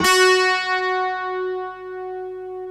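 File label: slow strings guitar; Optical Media International - Sonic Images Library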